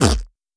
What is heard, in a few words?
Cartoon Splat